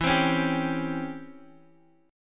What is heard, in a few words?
Db Minor Major 7th Flat 5th E